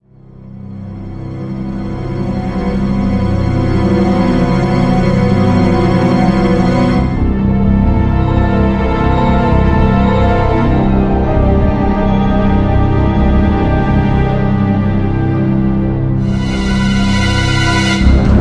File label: lumber music